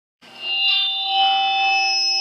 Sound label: megaphone feedback